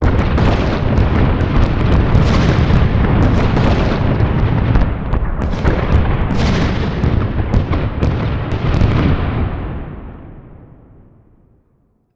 Explosion Multiple Blasts; Fire and Explosions

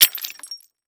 Crash Glass Drop On Wood; crashes; AdobeCC

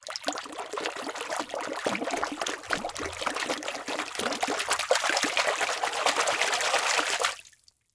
Liquid Water Water Hands Splashing In Water

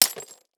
Impact Mallet Hit Ceramic Tile

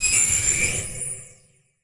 Transition Whoosh Laser

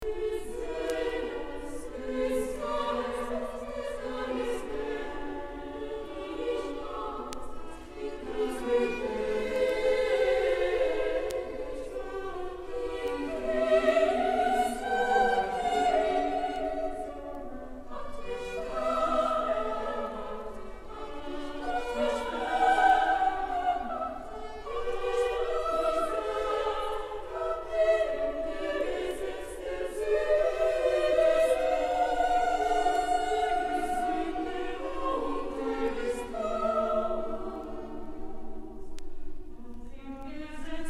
Bach Motet; BWV 227 IV; Bachchor Stockholm; Bach 6 Motets

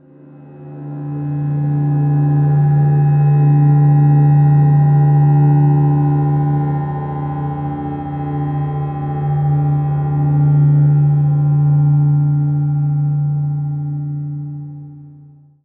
Title Transition Stab Dark Mysterious; Production Element

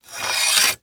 Technology Electronic Stand C Stand Extending

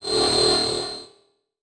Multimedia Internet CD-Rom Flash Hi-Tech

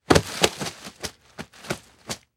Human Crawl Through Grass Short

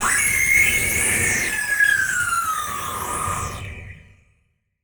Transition Gurgle Warble; Production Element

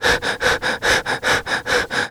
Horror Scary Human Male Heavy Panting Fast

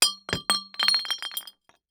Impact Glass Bottle Drop No Break; Impacts

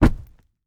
Horror Body Fall Hitting Dirt

